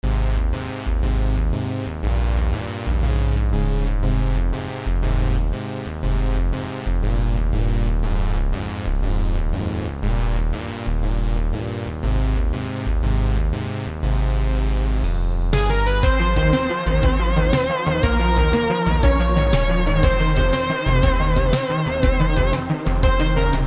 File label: Techno